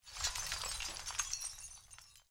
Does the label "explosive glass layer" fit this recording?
Yes